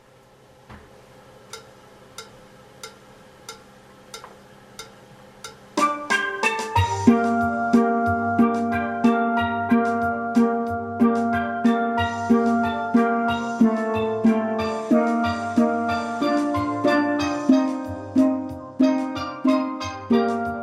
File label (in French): alto theme + accompagnement; BELLA CAO